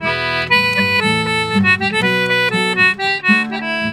Celtic Flavours; USB Soundscan vol.42; AKAI

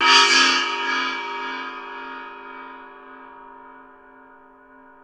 NEPAL CYM, Asian Cymbals

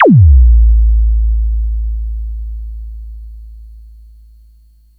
thump; Best Service Alpha Dance vol.2; ZAPS+THUMPS

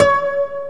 KOTO C#3; AKAI S-Series CD-ROM Sound Library VOL-7

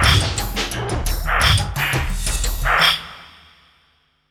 87 VRAC END 3, Industrial Loops

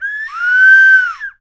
BRD CAL, BIRD CALLS, Percussion; Voice Crystal - Steve Reid Definitive Percussion

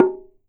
TANG KU, KOREAN DRUMS; AKAI S6000 CD-ROM; WorldSounds

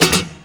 GUIT BREAKS; Best Service; World Colours

